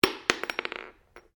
Cartridge 38 special impact polished wood, shell casings; shell casings of different calibers impacting on different surfaces